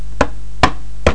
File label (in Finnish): kop kop